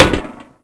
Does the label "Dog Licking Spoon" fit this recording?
No